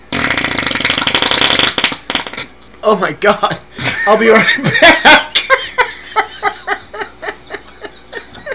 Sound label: farted